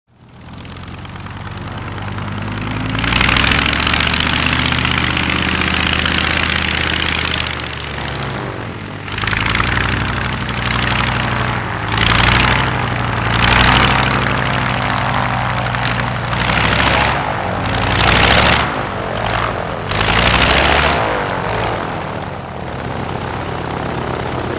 Prop Plane; Taxi / Cut Engine; Old Prop Aircraft Taxi Up To Mic Revving, Then Switches Off